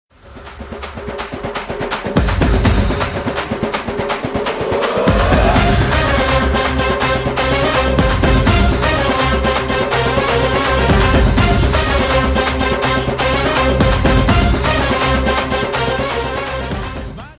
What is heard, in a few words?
BGM Drum Beats; Seema Raja